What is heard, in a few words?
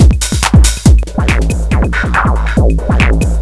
bass